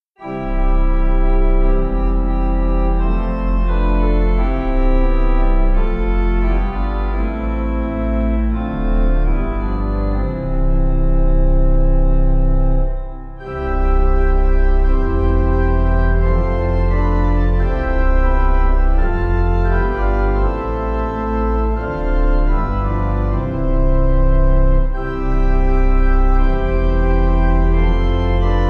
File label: Pipe